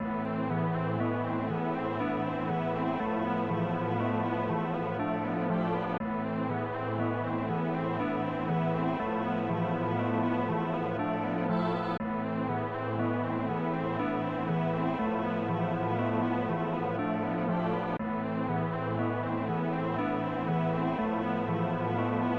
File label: Cave; treasure conflix; snes